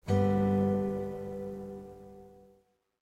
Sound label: g major open chord